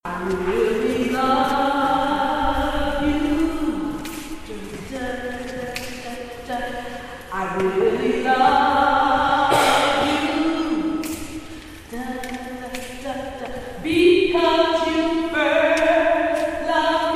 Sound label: SOPRANO, mass choir